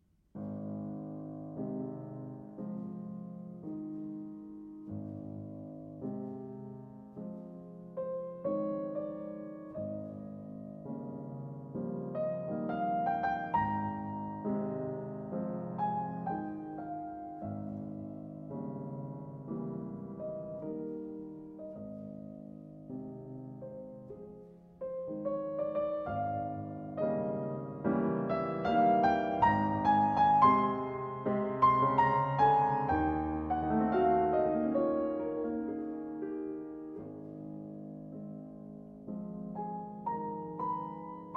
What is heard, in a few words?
Piano Concerto in A Minor, Romance Andante non troppo con grazia